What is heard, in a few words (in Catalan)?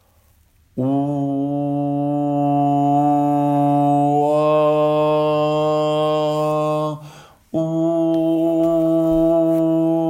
Cançó de mar BAJOS